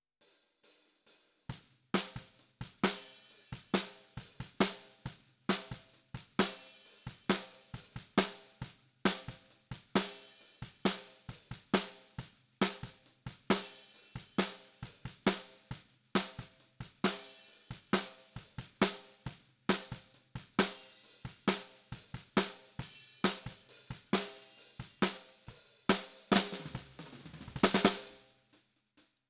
Snare Bot

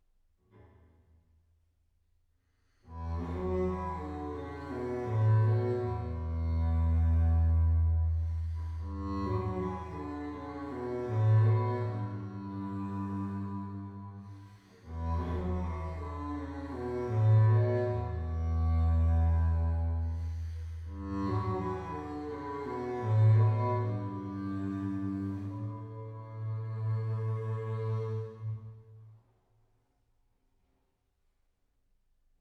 Bass

